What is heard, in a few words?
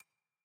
research panel hover